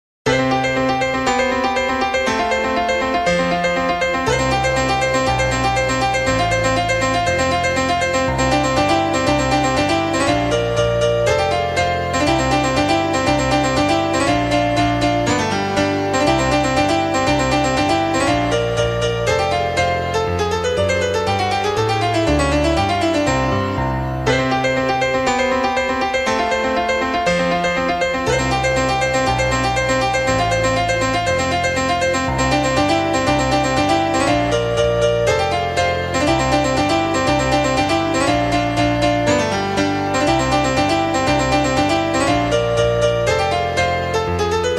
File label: piano rag in der pianobar